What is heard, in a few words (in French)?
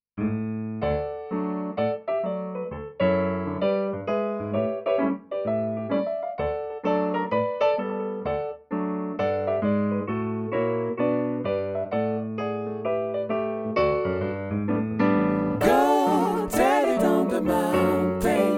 Go tell it Tenors et basses, Gospel